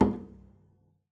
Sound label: pizz noise mute d, double bass; dsmolken; noises